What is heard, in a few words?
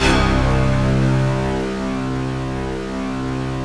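fast attack fuzz, Fuzzy Stacks; Optical Media International; Sonic Images Library; Fuzztones Stk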